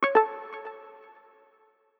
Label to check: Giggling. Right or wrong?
Wrong